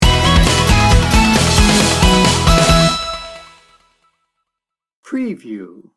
happy optimisim sting bumper

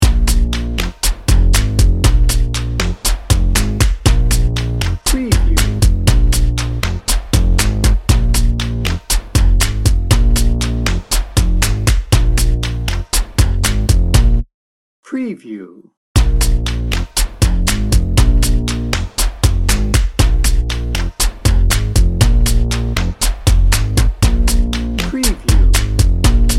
cool kids

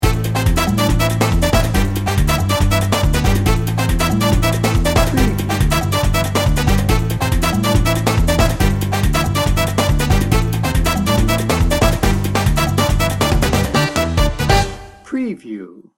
salsa merengue madness

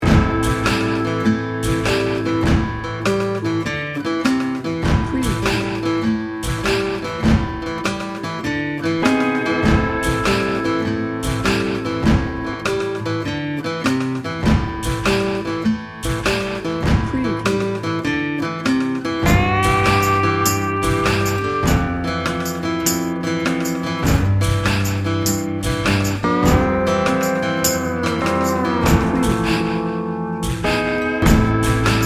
river snake

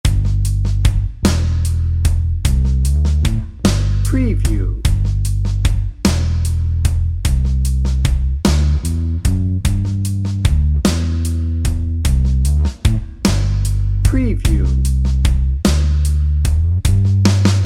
mountain high dnb

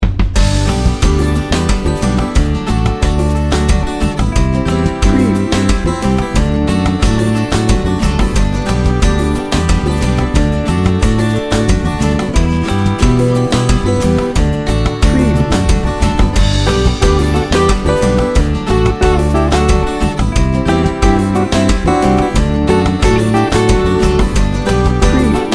tropical cumbia party

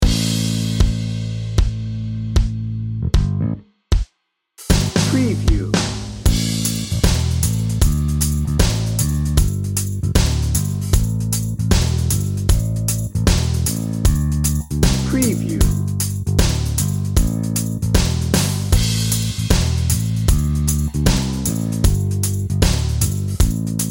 americana heartland

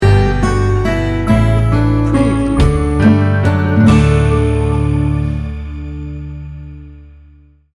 floating lazy day sting bumper

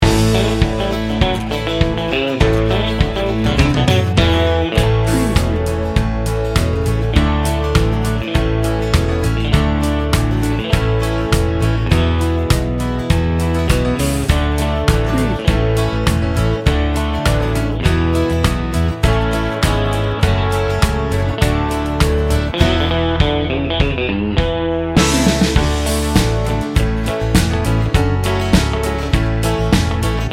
texas bbq bed

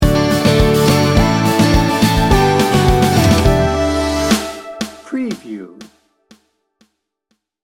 pure sunshine sting bumper

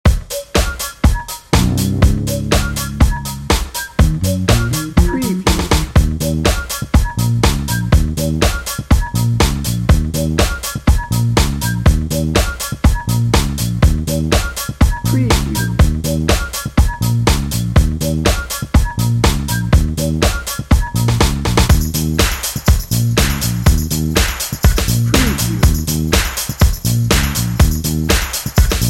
party starter dnb

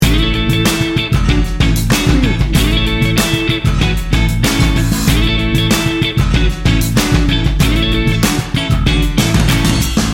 memphis soul loop

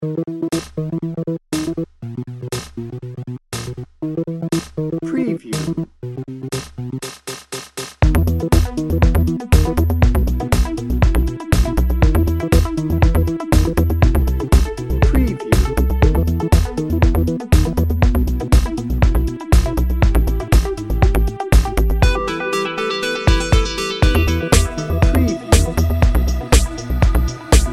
bouncy and dreamy bed

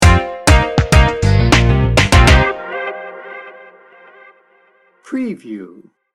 hypnotic chill out sting bumper

